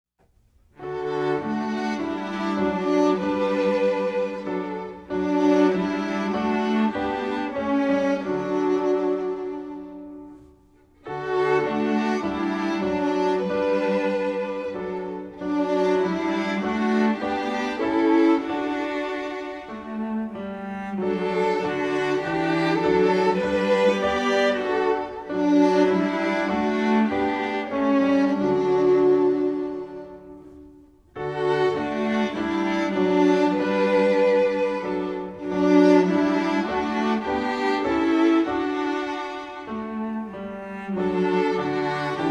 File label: music only